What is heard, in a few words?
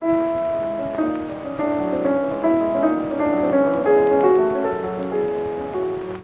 Prelude in C sharp minor op 3; loc-edison